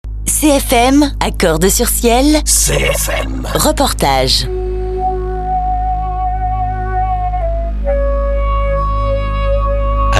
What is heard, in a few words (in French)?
CORDES; PAD FESTIVAL MUSIQUE SUR CIEL